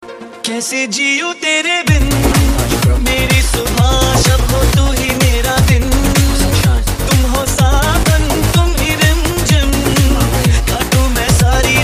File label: Romantic Remix